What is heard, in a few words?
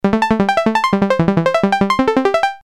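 arp random; nord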